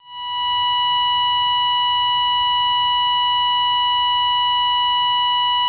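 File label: Heart Monitor Failure, heartbeats & ekg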